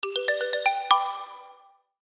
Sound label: Happy Motif App Music; Musical